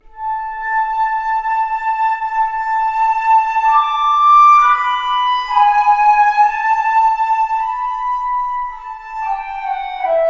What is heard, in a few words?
Flute